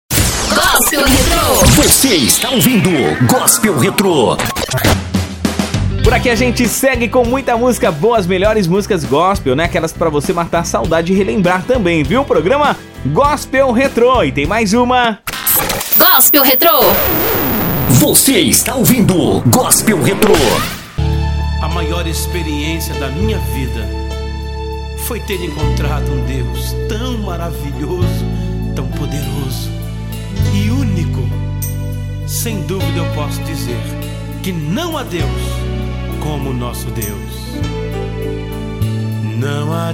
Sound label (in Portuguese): Gospel Retro